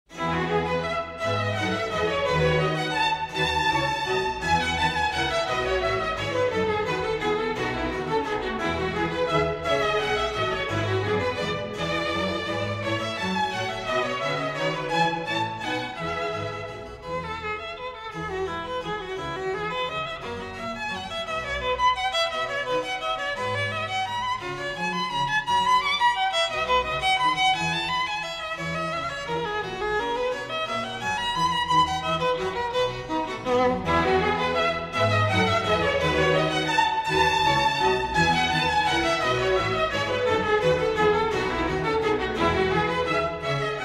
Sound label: Violin Concerto in E Major, Allegro Assai; Bach, JS; BWV 1042